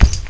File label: player fabric